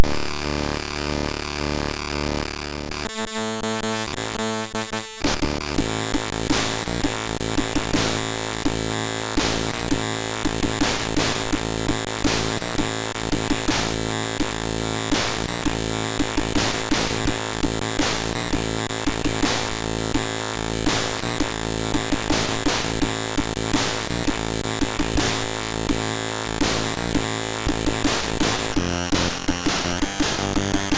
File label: White Noise; MOS6581R3